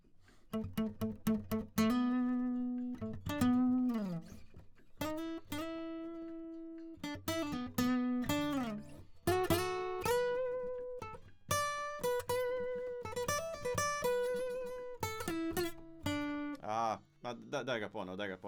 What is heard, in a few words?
Solo; Reaper project